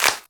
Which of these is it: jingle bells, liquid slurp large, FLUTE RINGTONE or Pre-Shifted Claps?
Pre-Shifted Claps